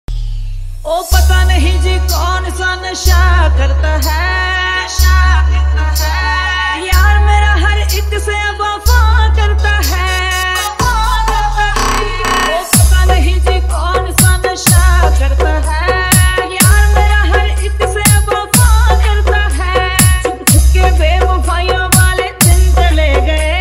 Trending Hindi Dj Remix Song Hard Faadu Electro Hous